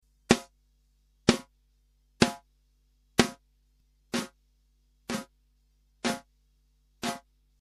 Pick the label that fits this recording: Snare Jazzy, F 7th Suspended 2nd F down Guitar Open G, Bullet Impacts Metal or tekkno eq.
Snare Jazzy